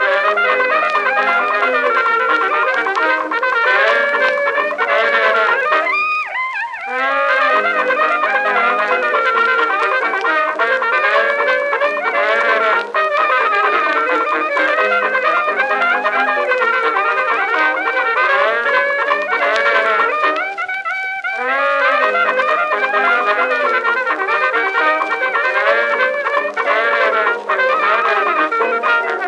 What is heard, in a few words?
jazz loop; The Ship Murder Party